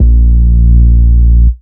Root A#, Ultimate Bass; Vengeance Samples

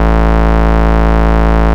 Bass Root A

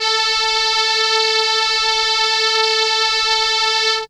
Trumpet A4, ARP Solina; musicradar-synth-samples

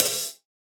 HiHat; Ghosthack

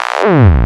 Slides; Vengeance Samples; VEH1 FX

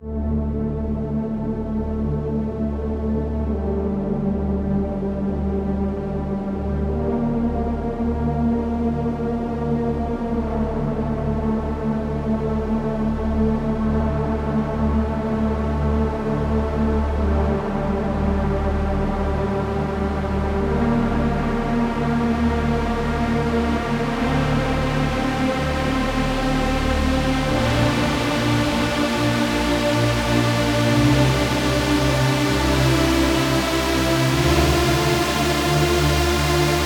Beast Kit 140BPM Pad Full, D minor; Unlooped; Vengeance Samples

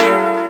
Synth Shot; Vengeance Samples

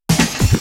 Loops Fills; Vengeance Samples

